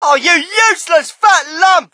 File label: crowd, shout; Mike Tyson Heavyweight Boxing